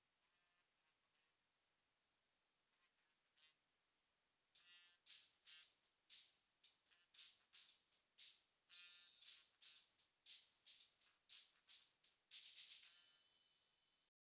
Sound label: filtered trombone